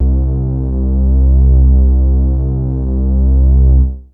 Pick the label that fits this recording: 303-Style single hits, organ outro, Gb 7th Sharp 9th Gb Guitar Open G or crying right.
303-Style single hits